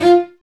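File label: STR VC, Strings, Marc, Attack; Vc Marc&Harm; Roland - String Master Series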